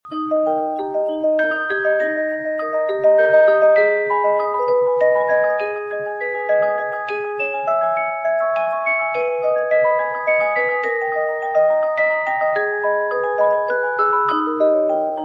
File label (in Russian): Muzykal`naia shkatulka